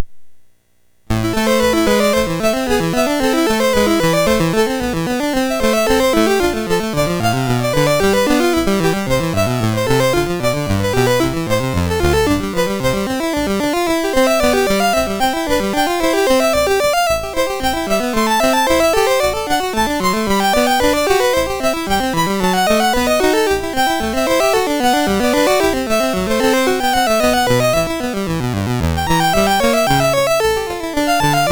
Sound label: Two Part Invention No13 MOS6581R2